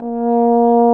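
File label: brass baritone; Roland - LA Composer vol. 1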